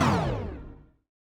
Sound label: charge release; HeavenStudio; charging Chicken